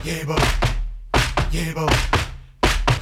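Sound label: 80 bpm, group chants; Spectrasonics; Supreme Beats; African Contemporary; Yebo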